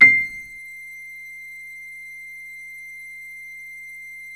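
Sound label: Vox Piano, Morpheus; 90s sample CD; Syntec; Wall of Sounds VOL-2; MORPHEUS-2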